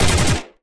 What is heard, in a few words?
Automatic Weapon Fire